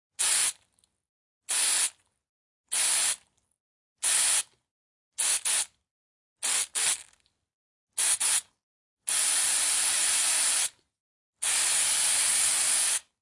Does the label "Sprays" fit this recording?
Yes